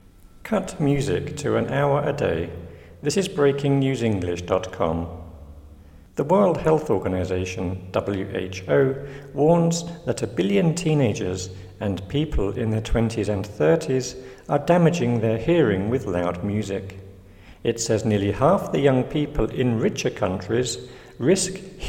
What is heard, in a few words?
loud music